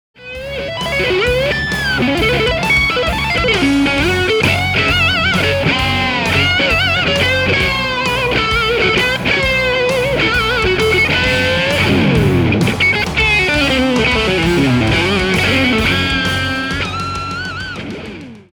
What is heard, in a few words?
Lead Guitar Boosted